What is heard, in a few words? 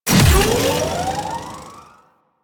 Mech Run Start; Nechro Mech; Vehicles